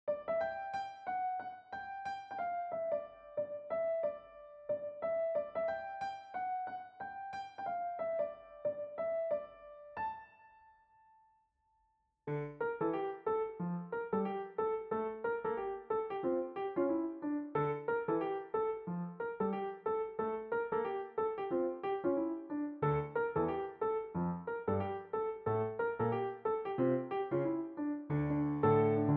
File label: solo, piano solo; Youth